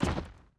Big Man Walk

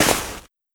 Cloth; Scum Cloth1

